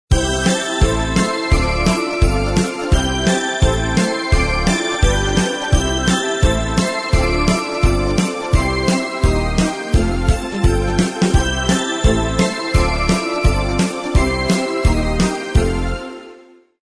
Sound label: Ringtones